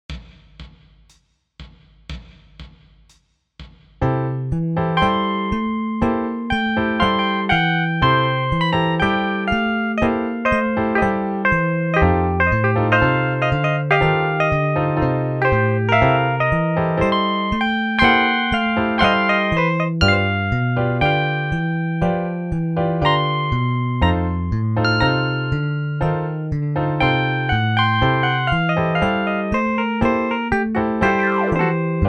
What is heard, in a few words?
MIDI blues; spellman studio